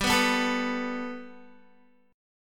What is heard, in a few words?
G Major Add 9th Guitar, chord; Gadd9; strummed; 14,14,11,14,11,x